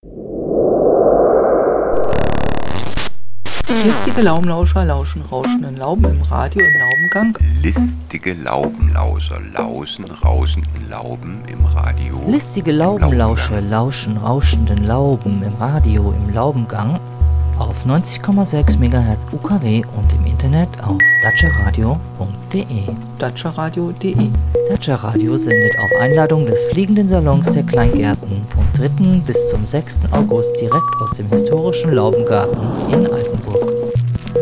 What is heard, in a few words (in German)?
jingle; datscha radio laubengarten